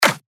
Clap; Ovechkin; revealed claps snares vol 2

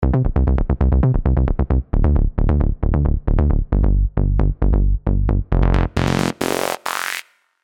Reactive Pluck; revealed serum 2 rave asylum

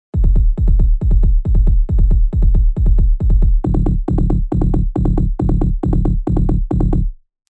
Rumble, rolling bass; Ready 2 Rumble; Revealed Serum 2 Rolling Bass Vol. 2

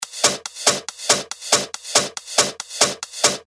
140 BPM, techno loops; Saturn; Revealed Techno Top Loops Vol. 9

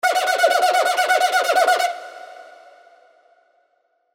Alarm; revealed serum fx vol 2

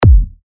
D#, techno kicks; Aqua; Revealed Techno Kicks Vol. 10

